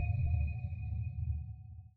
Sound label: sonar Tail Suit Medium Directional; Barotrauma; Real Sonar